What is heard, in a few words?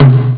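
tom reverse; jazz jackrabbit 1; M8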